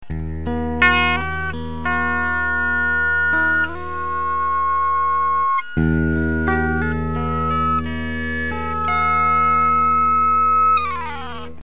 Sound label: sust clean harm, guitar; Fernandes ARS550; сустейнер в режиме гармоник на чистом звуке